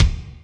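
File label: kick Chunky HL, Alesis D4 drum machine; 300 Drum Machines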